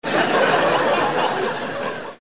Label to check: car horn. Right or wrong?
Wrong